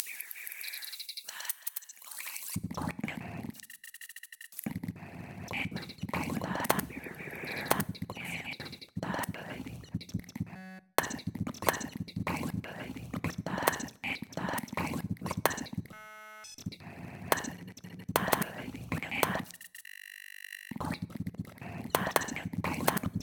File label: whispering texture